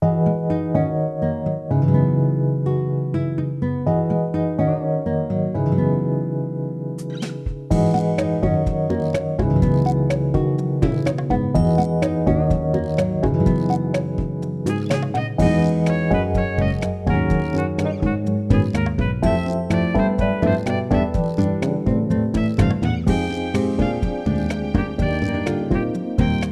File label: Staff Roll - Saxophone Version; Personal Trainer: Cooking